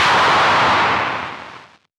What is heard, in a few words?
Clap; Vengeance Samples; VEC1 Claps